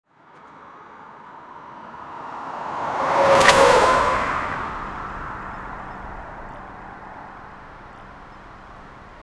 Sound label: super car moving high spe; garage